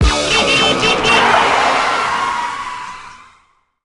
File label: Defeat; Advanced Circuits; DSiWare; NDS